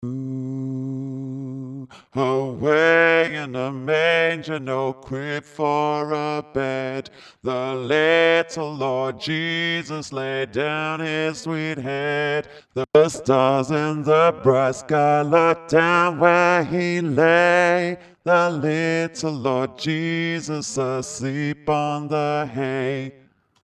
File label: bass; away in a manger